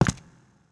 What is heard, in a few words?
step; GoldSrc